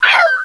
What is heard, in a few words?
YELP, dogz